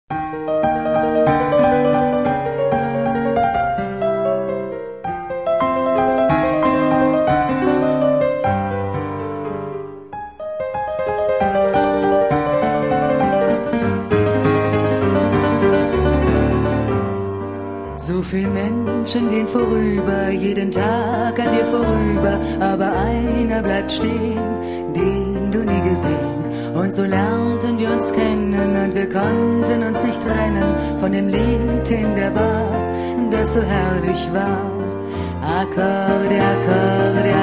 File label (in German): akkordeon